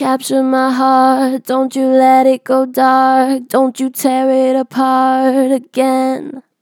Strong Vibrato, Natural Vibrato Strong, Vocals; Capture my heart; Harmor Vocals

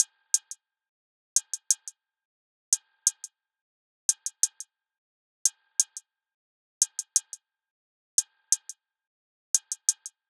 Hihat Loop - 177 BPM; Suave

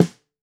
Steel Rimshot, Snares; Ghosthack - Free Snares 2023

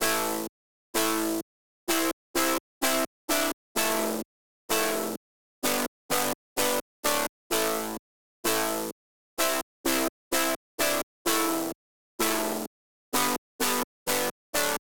128 BPM A Min Chords; Drop Loops; House; Cymatics; Paradise

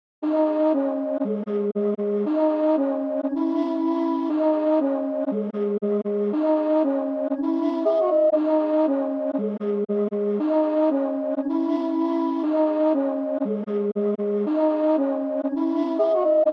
118 BPM C# Min Flute Chops, melody loop stem; Trap; Loop Stems; Cymatics; 2022 Melody Collection - Trap Pt 2; Last Fight

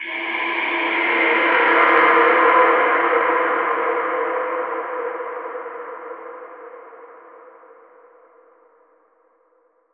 Atonal Depths, Psytrance

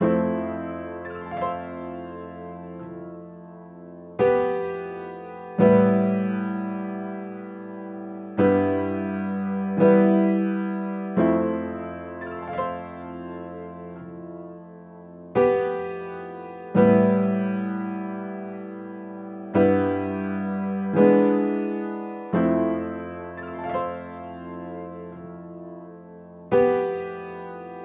172 BPM A Min Piano, Loop Stems; Melodies; Cymatics; 2022 Melody Mystery Expansion

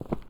Footstep Stone Heavy

